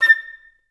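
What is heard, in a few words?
flute staccato A3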